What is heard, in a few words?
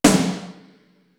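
AMBIENT SNARE; East West; Global Sounds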